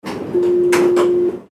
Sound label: tram door opening